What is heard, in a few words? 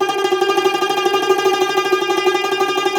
CUMBUS Tremolo